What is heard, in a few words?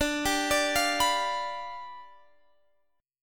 G 7th D